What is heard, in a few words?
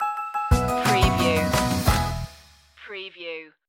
candy apple pop stinger